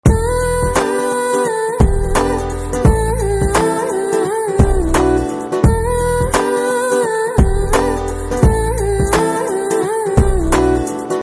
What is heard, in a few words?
Humming